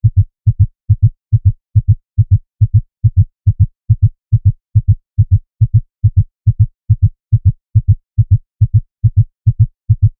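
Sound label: a human heartbeat starting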